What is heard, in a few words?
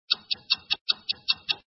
countdown; guanDan; zhuandan